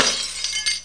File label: Breaking Glass; PowerOut 1.02